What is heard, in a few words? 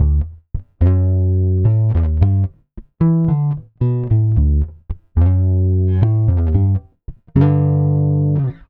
Pop, Bass